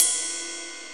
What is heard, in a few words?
RIDE PING; E-MU Formula 4000 Series Vol. 2